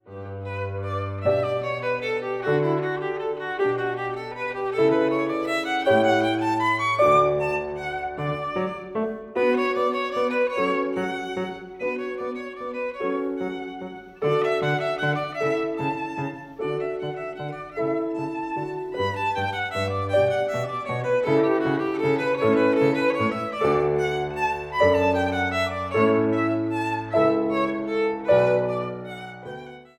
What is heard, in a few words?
VIOLIN